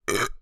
human male burp deliberate

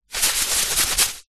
seeds packet black chia shake

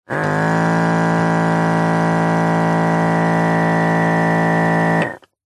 hospital blood pressure machine motor whir fail

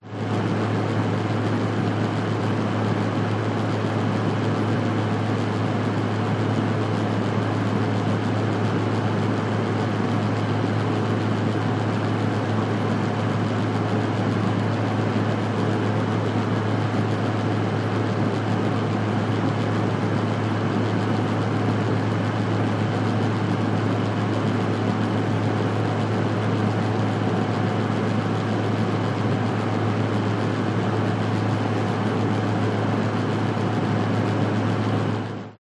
household toilet extractor fan operate

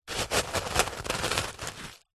stones small wood batton dig in; foley

